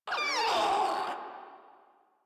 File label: Infested Crawler Hear Sound; Warframe